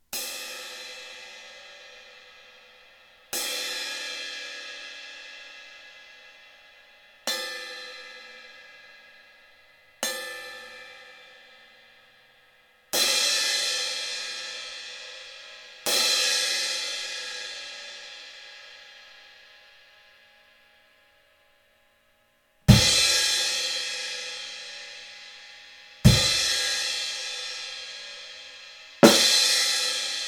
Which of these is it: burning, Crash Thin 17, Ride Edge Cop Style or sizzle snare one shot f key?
Crash Thin 17